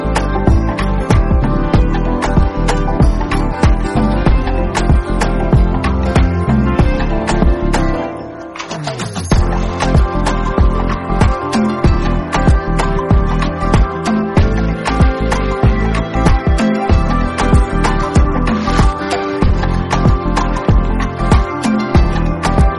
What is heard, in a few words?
instrumental ringtone